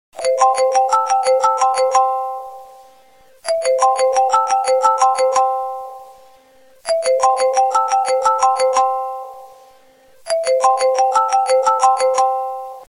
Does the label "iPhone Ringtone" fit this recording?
Yes